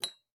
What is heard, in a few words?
Cup Drop; Game rip; MiSide